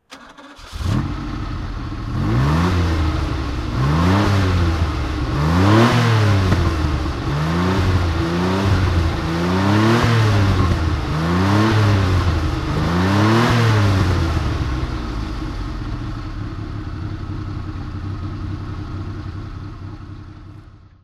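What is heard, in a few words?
maserati merak ss throttle, Engine sound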